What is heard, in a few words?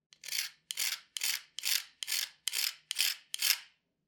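scraping sound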